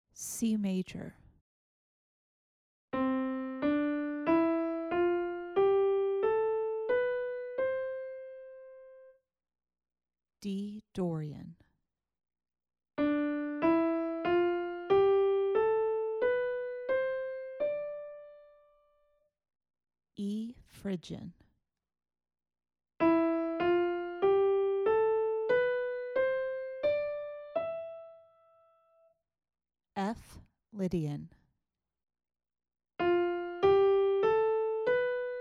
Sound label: relative modes